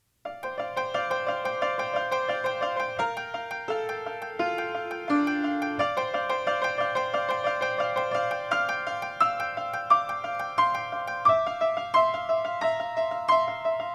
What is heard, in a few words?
Piano